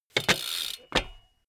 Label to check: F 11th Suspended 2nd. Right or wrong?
Wrong